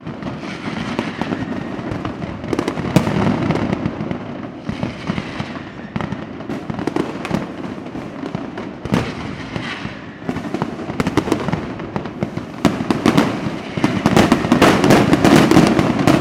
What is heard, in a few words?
fireworks display intense; sound effects one